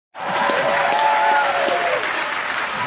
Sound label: crowd applause